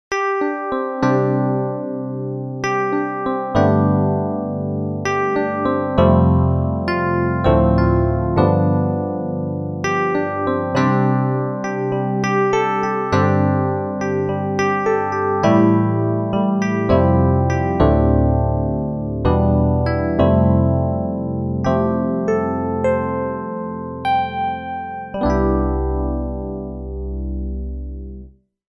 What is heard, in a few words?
Korg Grandstage EPiano FM Piano Basic